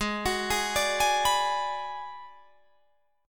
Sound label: Ab Minor 7th Flat 5th Ab, down, Guitar, Drop D